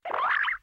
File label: anime sound renew